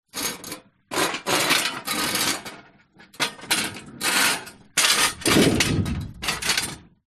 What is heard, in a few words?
industrial road sign portable move street